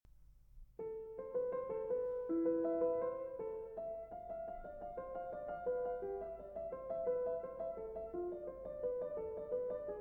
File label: Prelude and Fugue in A Minor Fugue